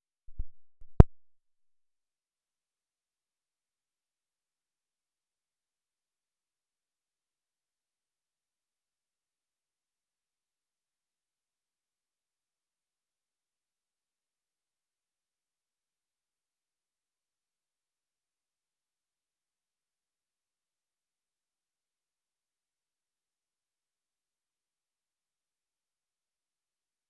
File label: Bass DI